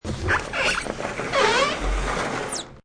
Slide Dr Opn Slw, Sliding door opens slowly.; Part of Sliding Doors (Sound Effects:Doors)

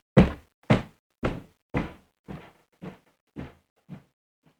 Footsteps On Wooden Floor; horror

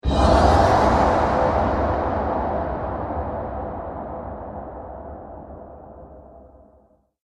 Epic Impact; Miscellaneous Impact Sound Effects

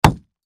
Impact Rock Large Hit; Rocks; Sound Effects:Materials

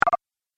Game Pause, video game

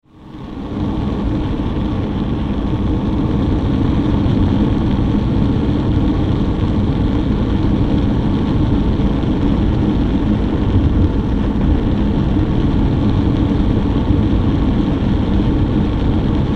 Industry Propane Heater Run Blower; Machinery Sound Effects; Machinery (Sound Effects:Machines)

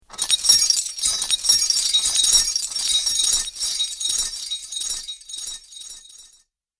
Metal Chains Magic Ambience; Clink, Clatter, Clang, And Clink Are The Sounds That These Chains Make.; Andrew Fleming

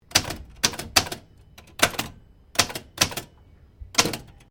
Typewriter Remington Standard 10 1900s Sequence; Old typewriters; Typing; Offices

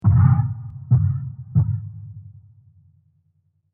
Heavy Impact Bang Thud Thud; Low Impact Sound Effects

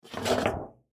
Household Cookie Sheet Pick Up Wood Table Metal; Kitchen; Blastwave

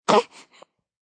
Baby Grunt, Newborn baby sound; Babies; Voice

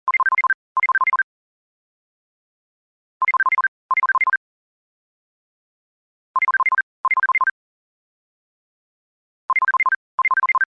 Technology, Cell Phone Ring Tone; Electronics; Rings

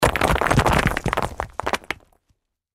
Crash Rock Debris Small Landslide Boulders; Crash Rock Debris Small Landslide Movement Boulders

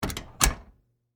Foley, Door, Close, Hotel, Room; Miscellaneous Doors; BlastWave

